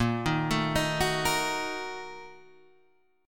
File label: A sharp Major 7th Suspended 4th Sharp 5th, Guitar, Standard; arpeggiated; JGuitar